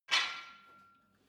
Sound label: barbell weight rattle soft, fitnessstudio